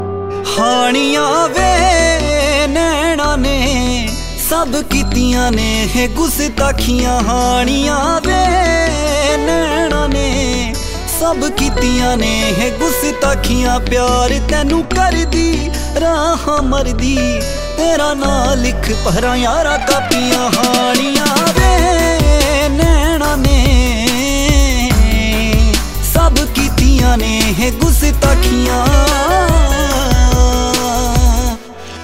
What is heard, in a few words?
Punjabi